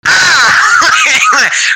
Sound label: augh